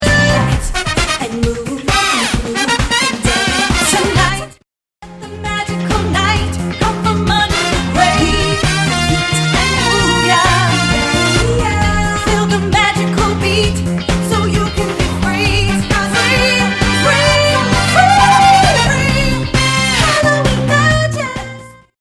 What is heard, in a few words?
Horns